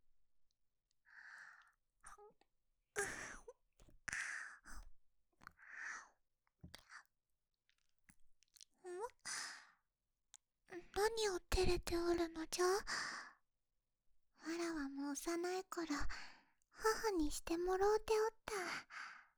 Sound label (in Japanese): 耳舐め, ASMR; 何を照れておるのじゃ